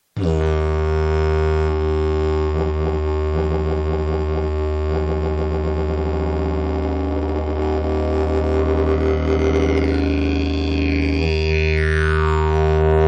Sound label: erle Eb, didge; Material Mundstück: Kirsche; Tonart: vor dem Umbau: Eb, Overblow: E